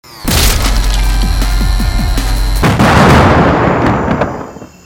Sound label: Item Box; Onramp